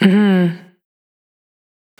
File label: Mmmhmm, Single Voice Melodic Loops; Singles